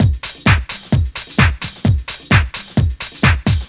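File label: drum loop 130; House; Best Service ProSamples vol.10 - House; AKAI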